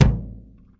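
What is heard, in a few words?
thick acoustic bass drum; Kicks; single hits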